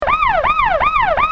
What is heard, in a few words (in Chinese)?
警急; 告警; 广播室铃声系统